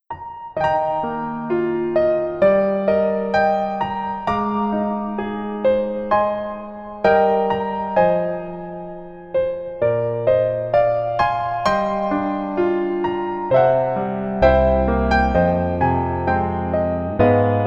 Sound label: Piano ver